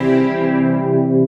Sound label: PAD